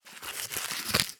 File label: food cake small remove from paper cake case; zapsplat